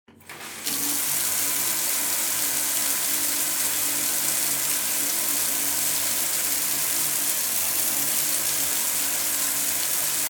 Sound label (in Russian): zvuk dusha